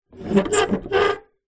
metal lever or hinge rusty old movement squeak creak; zapsplat; foley